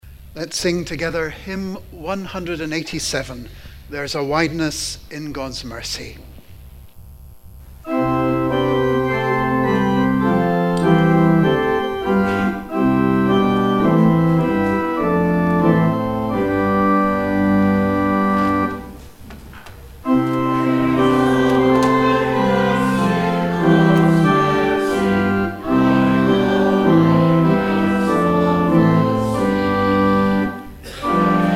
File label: hymn